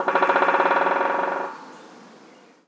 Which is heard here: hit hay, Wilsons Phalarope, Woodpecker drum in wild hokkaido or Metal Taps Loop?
Woodpecker drum in wild hokkaido